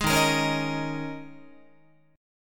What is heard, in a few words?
Eb 7th Suspended 4th Eb, Guitar; Eb7sus4; strummed